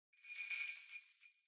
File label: Sleigh Bells Dry Noise